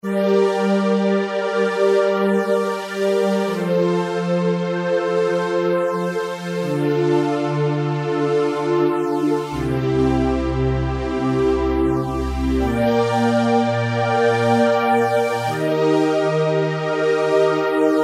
FLANJ VOX, Kurzweil K2000; Kurzweil K2000 Contemporary ROM; Contemporary ROM Programs and Demos diskette

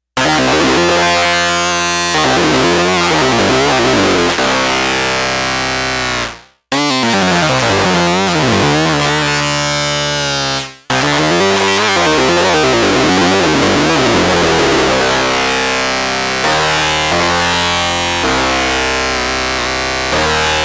Distorted Lead, Arturia MicroBrute; Factory patch sheets

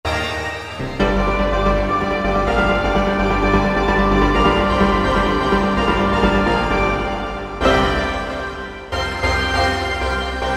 Roland V-Synth; Factory Patches

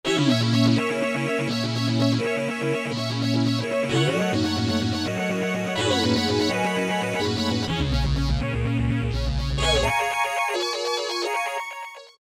LFO RAVE, Roland SC-880; Factory Patches

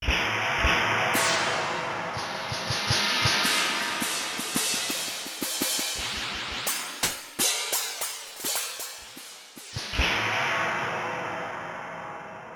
Effect-Cymbal Vari, Yamaha TX16W

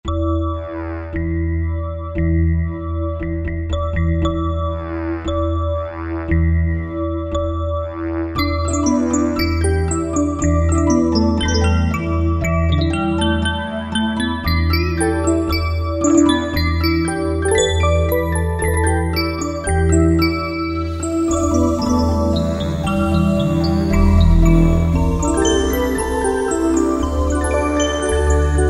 Mod Wheel Air, Korg Wavestation A-D

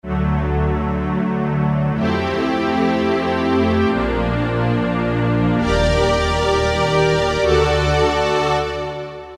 Warm Strings, Roland PN-D50-01

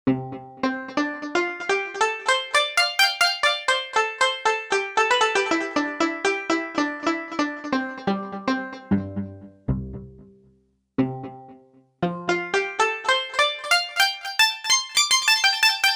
Elec. Koto; Korg MSC-1S Variety

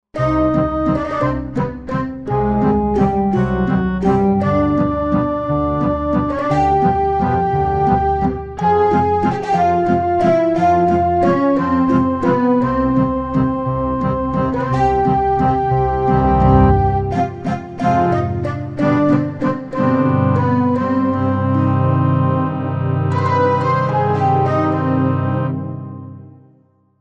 String & Woodwind, Korg Wavestation

